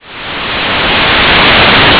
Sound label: noise